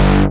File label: SEQUENCER, synths & keys; Techno Trance